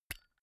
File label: zippo jump open